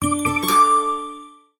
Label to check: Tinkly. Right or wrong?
Right